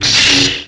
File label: lt saber hit, sabre laser; effets speciaux